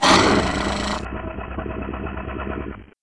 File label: death; mystic radar; mr zombie1; gonome